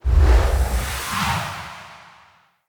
Wyld Decoy Cast; Fey; Saboteur

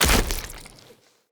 land heavy flesh, footsteps; Characters; Tenno

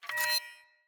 Sword Whoosh Accent; Weapons; Swords; Garren Sword

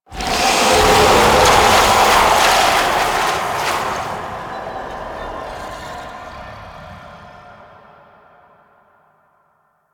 Hex Melt; gameplay; Warframe; NineteenNinetyNine; Stage11FuturePast

